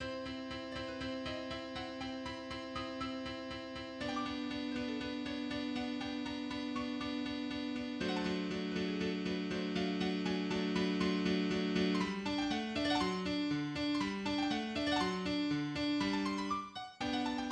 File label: Sonata in C Major, exposition transition; Mozart; K. 309, I